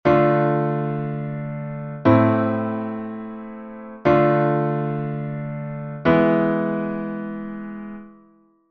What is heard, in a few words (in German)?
phrygische tonart; Phrygische Tonart, phrygischer Schluss; riemann 1882